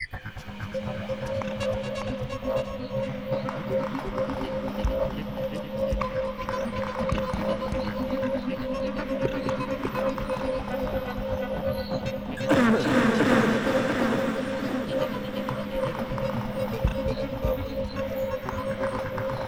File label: vocal